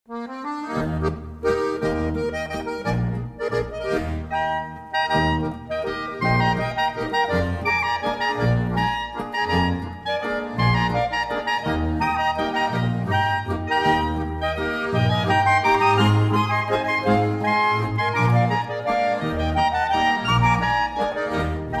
Halbwalzer; Kreuth

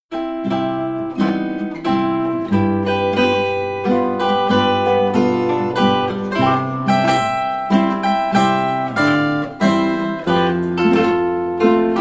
Alpenmelodien

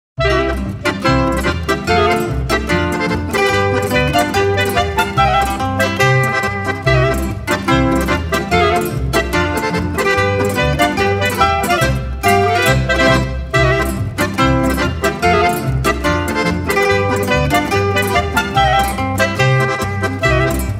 Polka; Rupertiwinkler

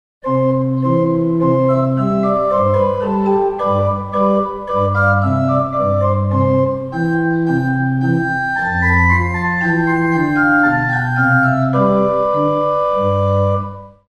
Menueto

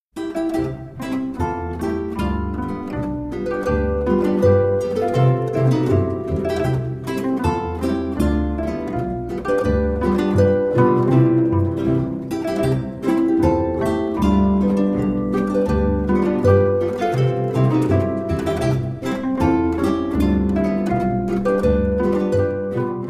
Saitenmusik Boarischer; Markus-Boarischer

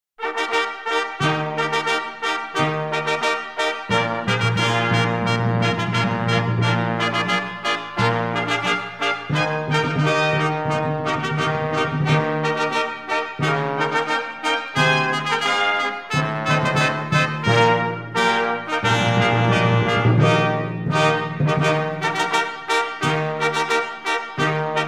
Ohne Worte Aufzug Maestoso

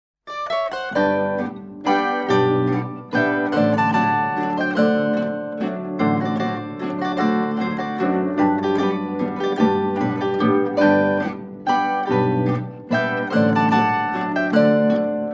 walzer

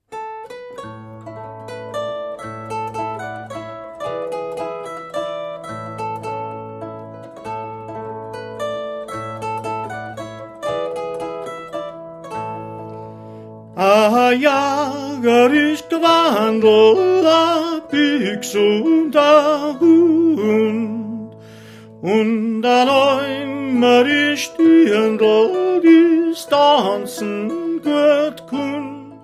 Oberbayerische Schnadahuepfln

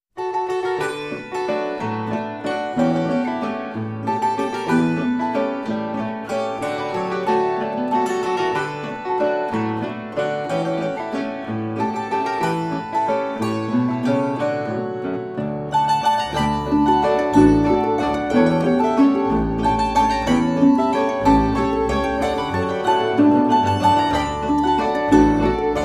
Tanzmusik Saitenquintett Walzer

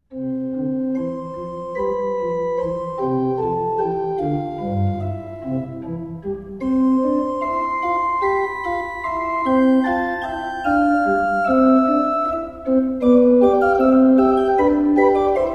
Pastorella

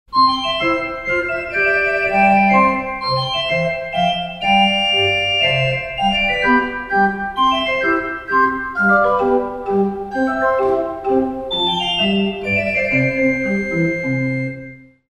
Pastorell

